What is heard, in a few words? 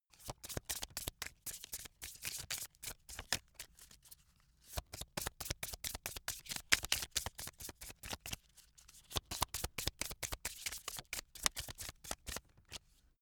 playing cards shuffle; comedy-cartoon; spielzeug